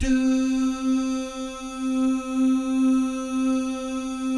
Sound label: "doo" guys, Vocal Jazz Fx